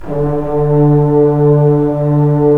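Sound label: brass trombones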